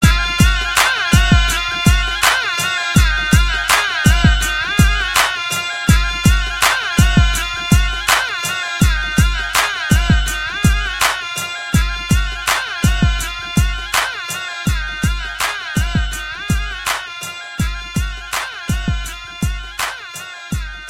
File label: Punjabi HipHop